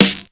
sizzle hip hop steel snare drum sample f sharp key